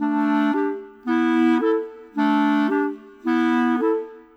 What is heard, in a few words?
Clarinets, Orchestral; Sonic Foundry (Sony Creative Software); Orchestral VOL-2; Modern 21